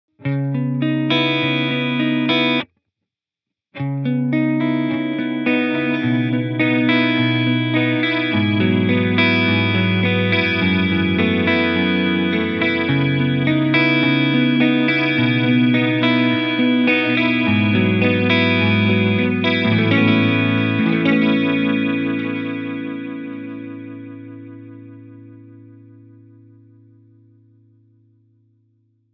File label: Digital Pristine, echosystem